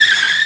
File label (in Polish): auto hamowanie